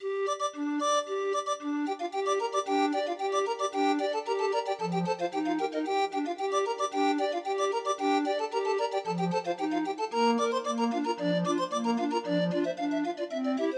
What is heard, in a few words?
Grind Organ, 20 note MIDI file